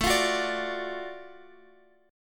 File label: Gb Minor Major 7th Sharp 5th, chord